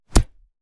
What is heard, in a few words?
Fight Impact; SoundsCrate